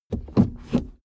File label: suv change reverse gear; verkehr transport; autos